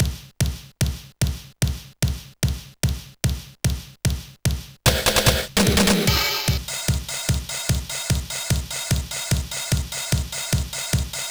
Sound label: Disco, Dynamic Drums